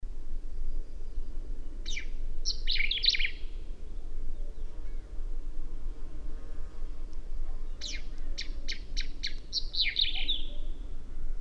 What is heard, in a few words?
male song